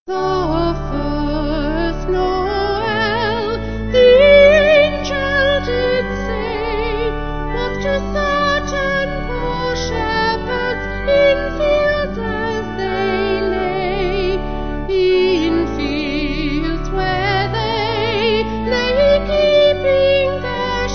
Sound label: Vocal Organ